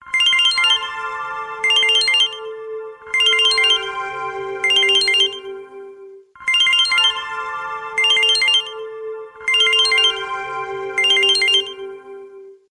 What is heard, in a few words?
Samsung Galaxy M52 5G